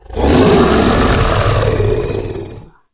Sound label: giant threaten; russian; sven; russian sounds